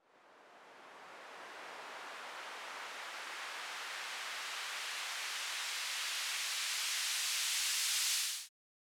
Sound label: 140 BPM Break Noise Riser; Vengeance - Essential Clubsounds Vol.5; Catch a Plane Kit; A#min